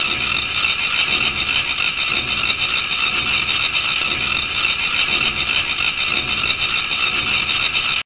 NOISE MK; Thief

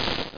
fireball; murk

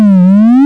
woop woop; Toxic Ravine v1.1; The Arcade

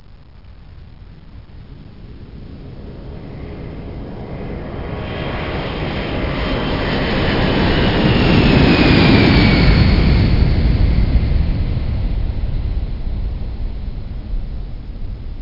jet

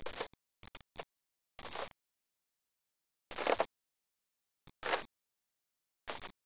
walk ice; 12000 Graphics Pack - A Complete Graphics Collection For Your PC

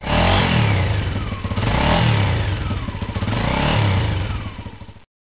motorcycle

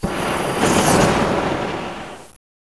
flamethrower burst; Gunlok

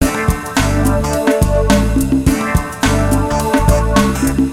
Funk acid; FUNKACID5

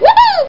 yipee

